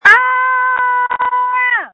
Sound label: Scream